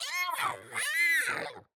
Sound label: FUN MONSTER Bouncy exotic ecstatic